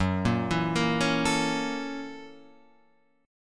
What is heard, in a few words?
F sharp 7th Sharp 9th, Guitar, Open F; arpeggiated; JGuitar